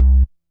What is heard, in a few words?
bass note, Cmin; Big Fish Audio; Hardcore Breakbeat Action; Roller 133